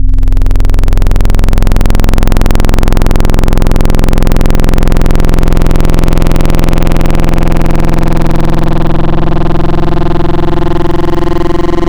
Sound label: Sin AM, Wavetables; Cymatics; Wavetables Collection Vol 3; Serum